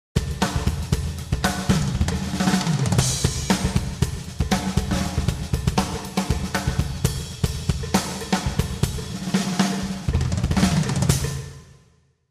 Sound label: fills